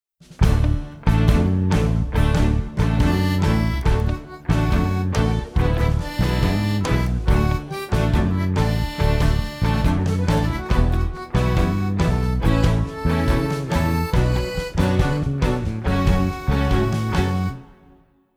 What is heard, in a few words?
Wedding Tango Performance; freaky friday